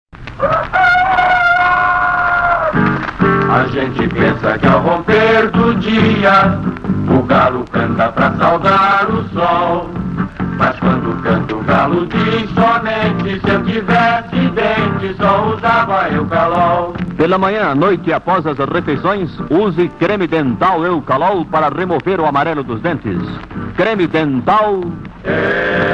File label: jingle; eucalol